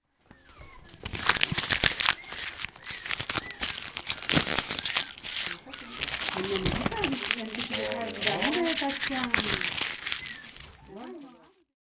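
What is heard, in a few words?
crumpling paper; 紙をくしゃくしゃにする音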